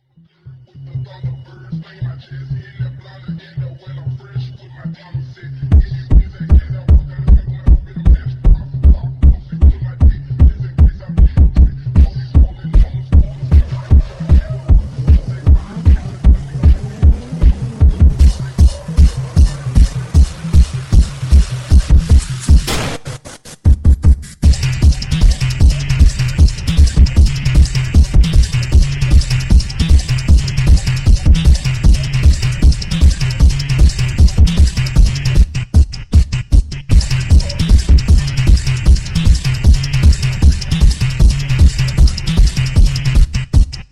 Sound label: phonk